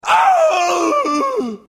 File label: male shout; mk2